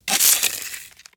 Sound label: dry ice sizzles